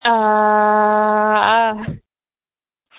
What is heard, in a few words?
screams